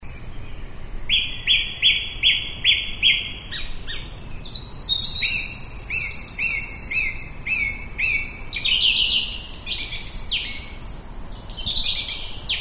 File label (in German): Singdrossel